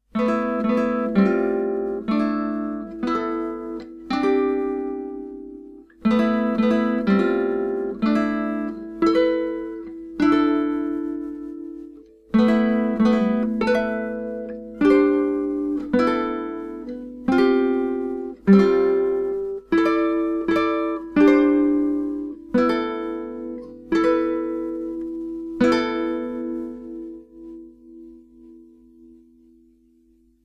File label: suspended minor